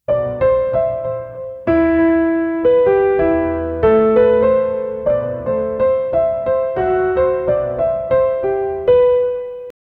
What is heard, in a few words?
piano instrumental